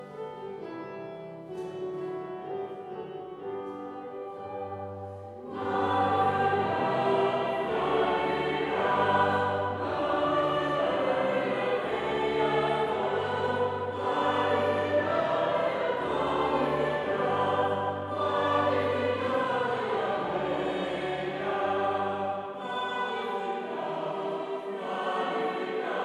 Taize

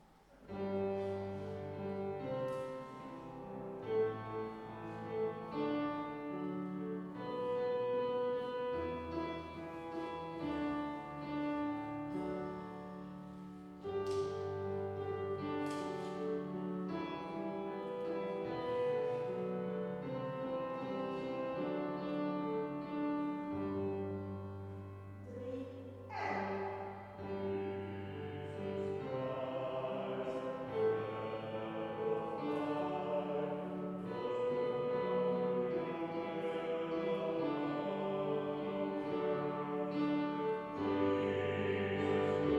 Taize BAS

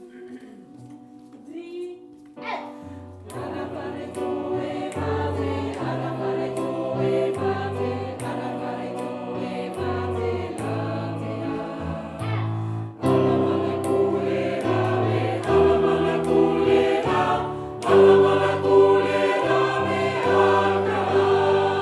SATB; SINT-CECILIA

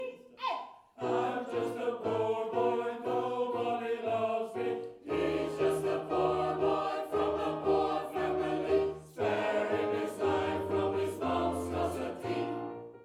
SATB

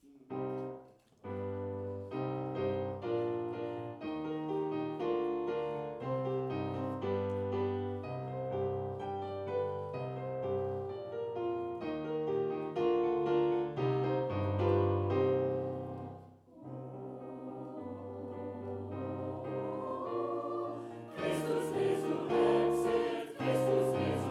Taize

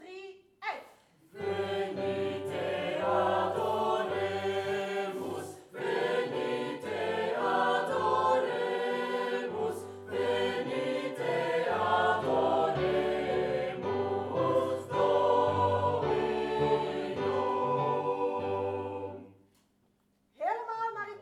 Adeste Fideles SATB

